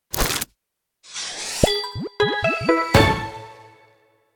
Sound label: Win Screen; Google Solitaire